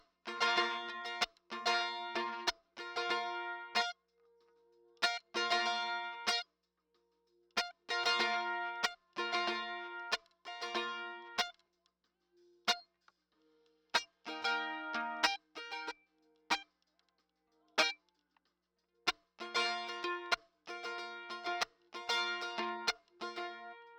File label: Mandolin SOLO; OneKnob Driver